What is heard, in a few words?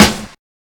verby snare sample g sharp key